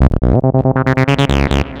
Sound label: dance music bass loop